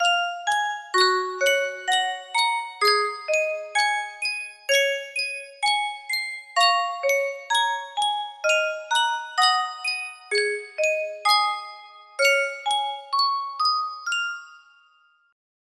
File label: Sankyo Custom Tune Music Box, music box melody; Romancing SaGa 2